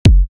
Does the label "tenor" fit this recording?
No